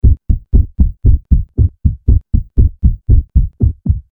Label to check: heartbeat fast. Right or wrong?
Right